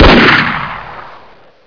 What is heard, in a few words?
shoot, weapons; qbb95